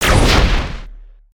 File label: Spider Boss Fire Shot; creatures mechanical